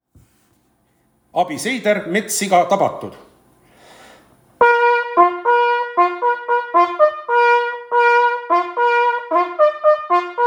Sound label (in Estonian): metssiga tabatud, Jahisarve võistluse signaalid